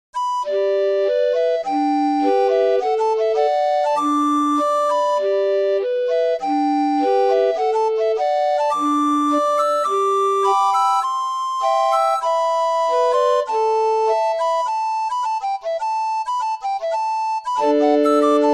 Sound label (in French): Narcissius, orgue barbarie